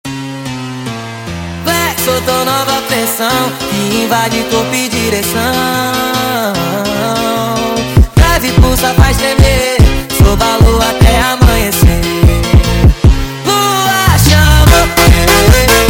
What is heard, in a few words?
Montagem sped Up